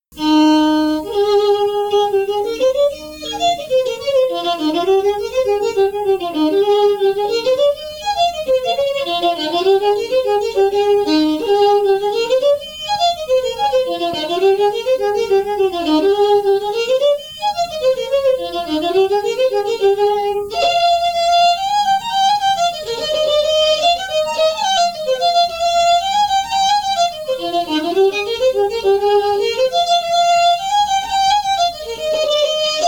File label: reel fiddle; SeamusGrantFestival; tune tutor